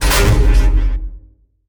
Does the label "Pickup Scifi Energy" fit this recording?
Yes